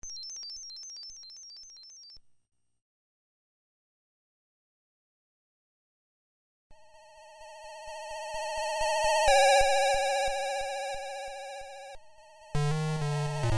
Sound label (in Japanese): ラストシーン PSG版; fray msx remastered soundtracks 2008